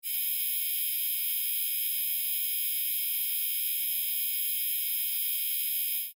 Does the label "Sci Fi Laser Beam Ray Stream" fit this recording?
Yes